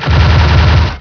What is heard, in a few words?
FLING